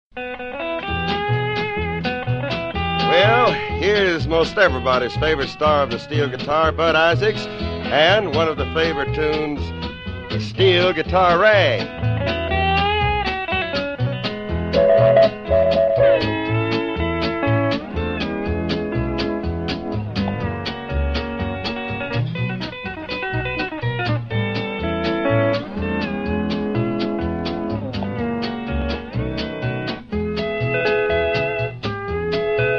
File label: Steel Guitar